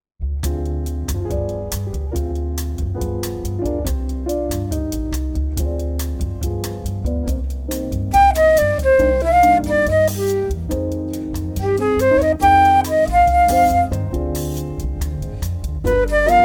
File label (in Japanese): ドミナント 7th